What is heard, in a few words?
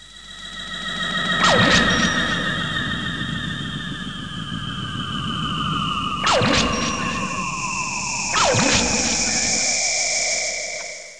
Time Star Zap; Let's Discover Massachusetts